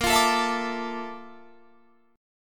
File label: A sharp Major Sharp 11th, Guitar, Standard; A#M#11; strummed; JGuitar